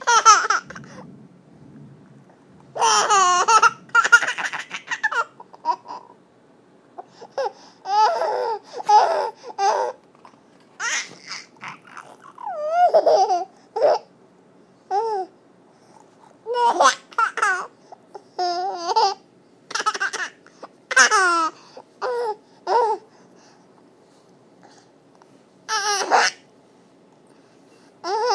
laughing

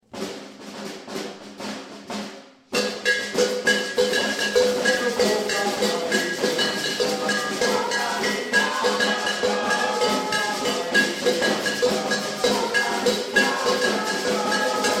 samba maracatu rehearsal

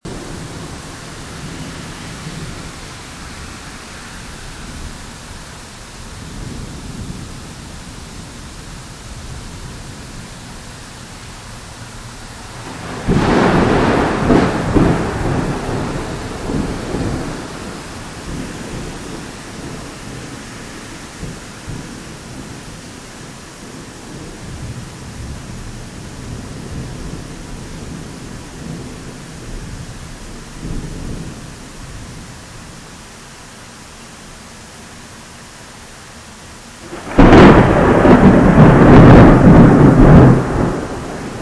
powerful Florida thunderstorm

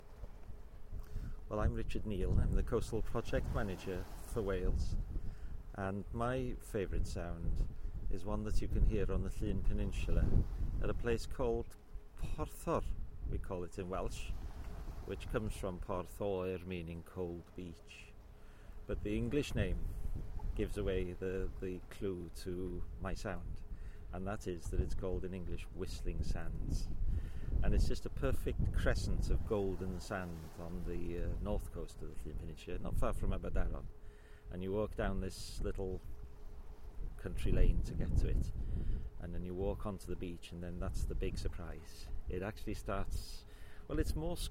the whistling sands; of porthor

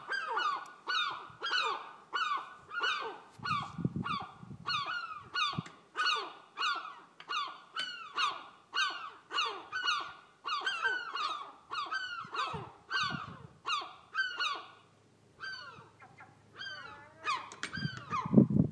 nesting gulls; south walney nature reserve

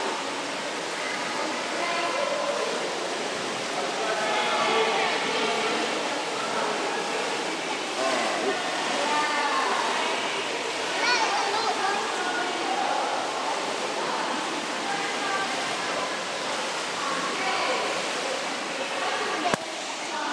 swimming classes

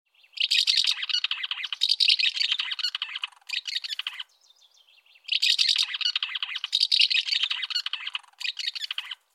ringtone house martin; credit lauri hallikainen

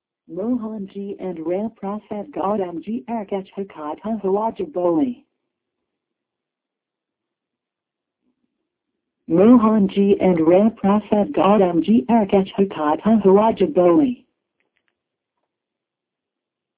hahahahhahaha; guru ji

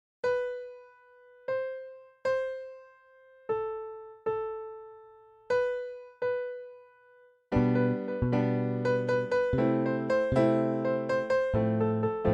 chord sequence

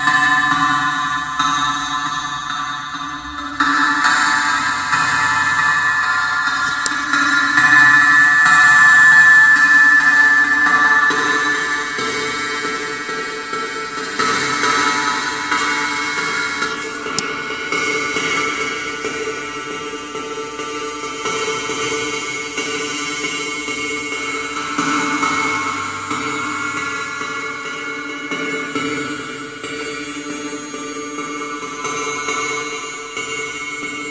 improvisation

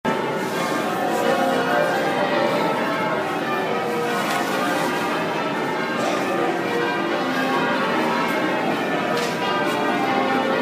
peal of bells at Southwark cathedral

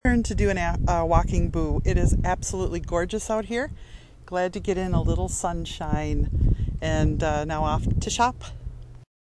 walking boo

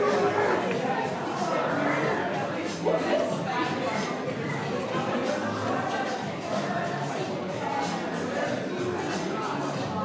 theatre lobby ambience; contact theatre manchester

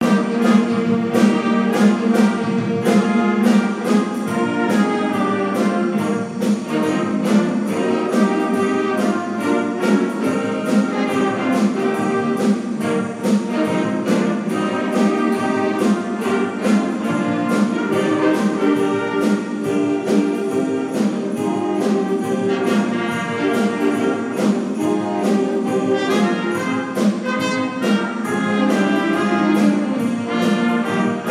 jazz band

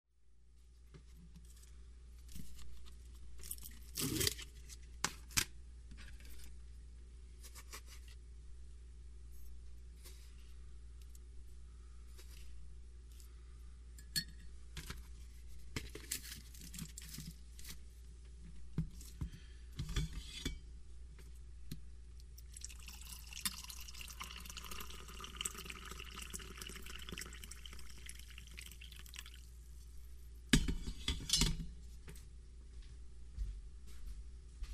soundscape morning coffee

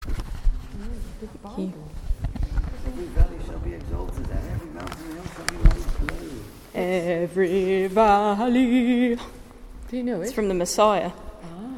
singing in the lovely acoustics of croome church

